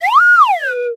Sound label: LOTUS FLUTE Whistle Up Down mono